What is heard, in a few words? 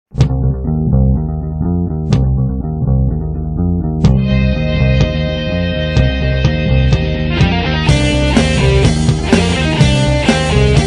theme hard rock; x-men